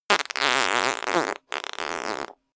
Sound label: fart bright